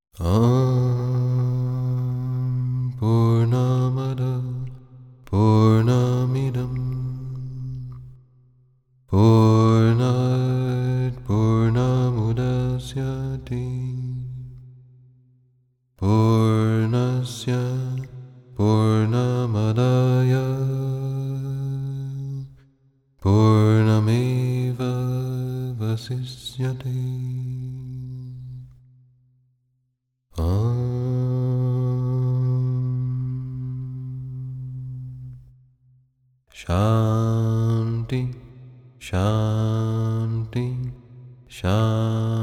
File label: purnamadah bhajan